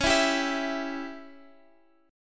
Db Diminished, Guitar Open F; strummed; JGuitar

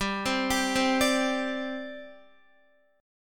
G Suspended 4th, Guitar; Gsus4 arpeggiated

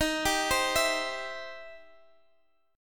E Minor Major 7th Sharp 5th Eb

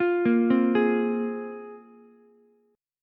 A sharp 7th Suspended 2nd, Ukulele chord; arpeggiated; 10,10,8,11; JGuitar

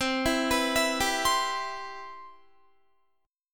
C Major 7th, Guitar

